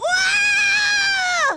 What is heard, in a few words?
pain; chiaotzu